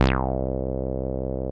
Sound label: TeeBee Light; Ableton; Retro Synths